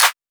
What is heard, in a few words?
Clap; Drum One Shots